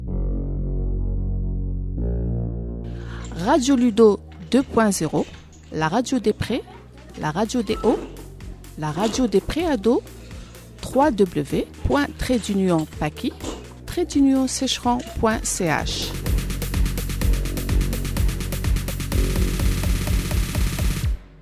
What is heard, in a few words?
Jingle